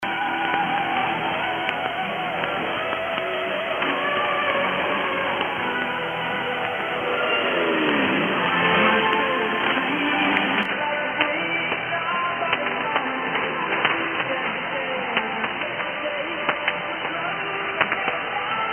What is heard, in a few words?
Pirat 6326khz